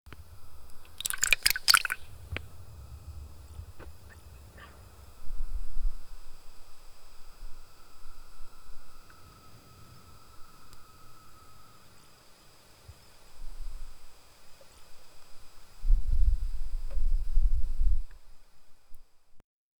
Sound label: nature sounds soft wind; gale